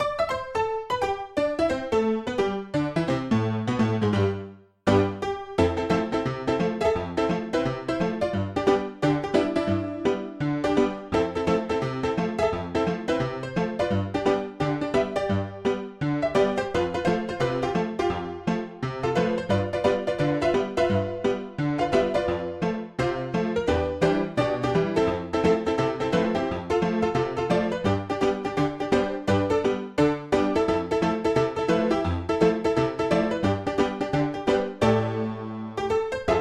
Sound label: Ragtime Piano cover